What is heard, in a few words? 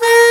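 taegeum A#2, Korean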